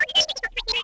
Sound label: vox, droids robots